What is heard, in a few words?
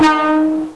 klaxon, Train; OpenBVE